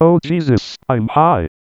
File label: imhigh, audioloops